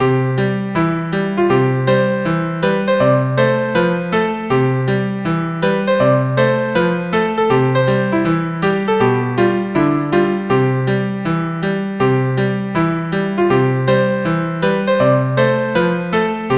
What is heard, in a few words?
the Snowman, piano arrangement, in C